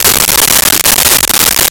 Break; Nintendo; July 2020 Gigaleak; DrMarioSound

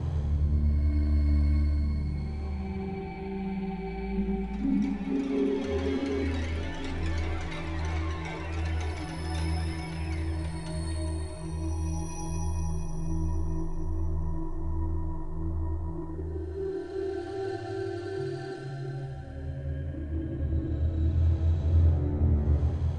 spooky, loop

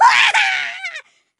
death flying, grunt involuntary; covy involuntary

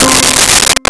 St Drum C4; Nintendo; July 2020 Gigaleak

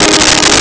5th Saw; BGM; Nintendo; July 2020 Gigaleak; DrMarioSound

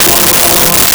Choir Ahhs F3; Nintendo; July 2020 Gigaleak

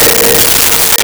Vibes Bb4; Nintendo; July 2020 Gigaleak

